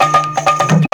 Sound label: BELL, Bhangra; AMG - Now CD-ROM (Roland)